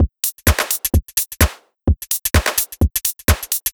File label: Beat 128BPM (Drums), techno; techno drum fx